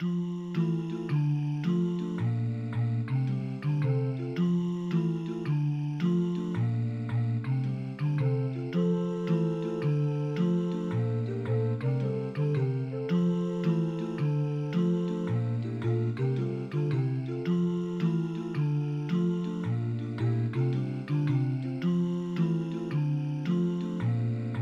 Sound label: Basses